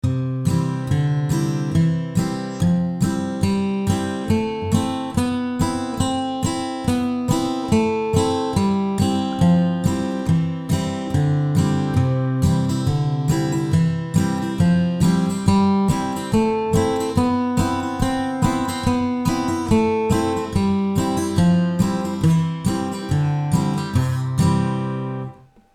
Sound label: aac scale